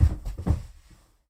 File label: Rope Fall